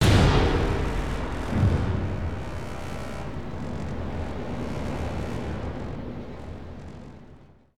bang thing; Crash Bang Boom

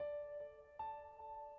keys softly, Sad Loops

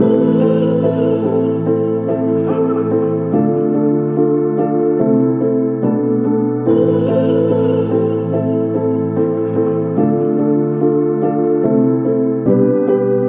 keys

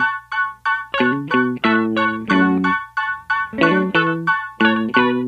piano, Loops; Phonky

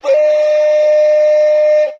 autotuned "way", Voice

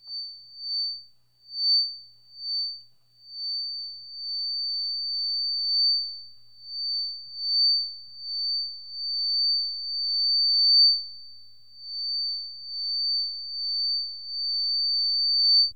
feedback

